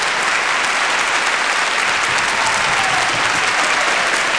Applaus

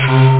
metallfloete

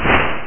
Leier; Crossfire Demo

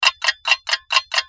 Uhr

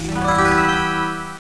Buegel

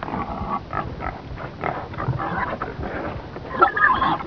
schweine

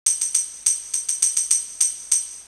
tamburin; winstrom

